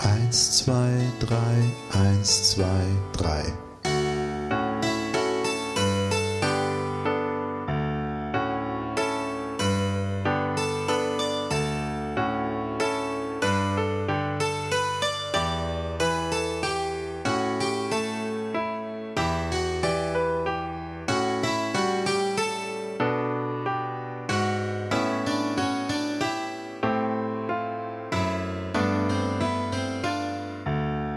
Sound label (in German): Lektüre Playalong